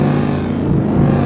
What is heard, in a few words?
brake; Nintendo